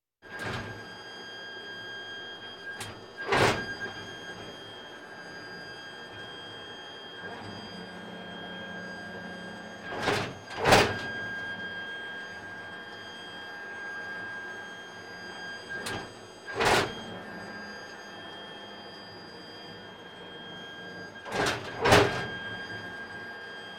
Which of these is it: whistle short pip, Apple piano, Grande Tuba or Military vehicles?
Military vehicles